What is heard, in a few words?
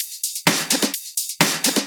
Electro Loop 128 BPM; Vengeance Samples